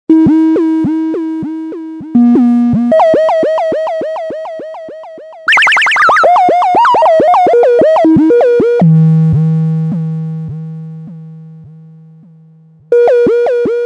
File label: linear fm